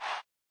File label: Chant, Hip Hop Kit; style kits